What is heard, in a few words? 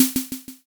snare